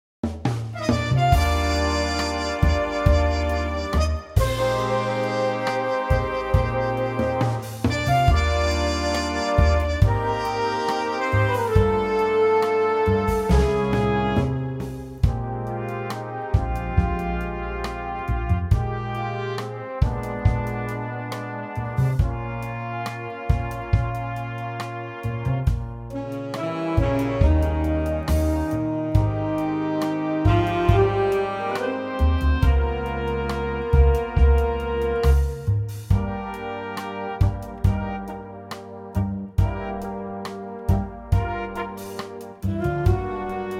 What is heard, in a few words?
Eb mellow Big Band